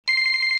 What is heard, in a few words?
beep